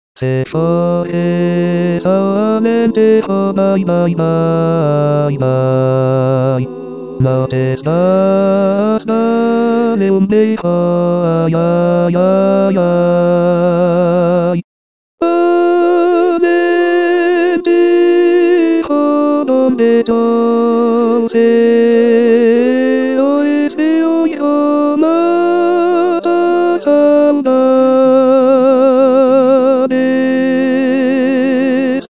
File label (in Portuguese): se fores ao alentejo tenor